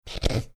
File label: snorting